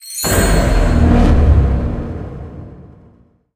respin start; rise of maya

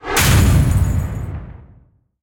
lock It Gather; narcos

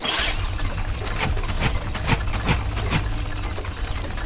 spin Start; wildotron 3000

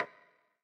hit Soft; advanced-roulette

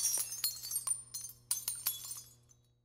coin Fall End; narcos

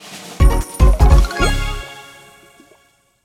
music big win end; reef raider

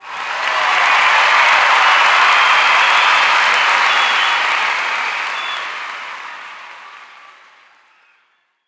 scatter background; pork knox

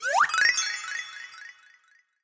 scatter Turn To Wild; robin hood

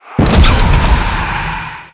win super mega win start; superstriker

